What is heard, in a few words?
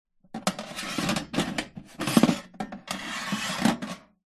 household air fryer oven metal grill tray place inside slide in